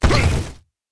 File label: die; propack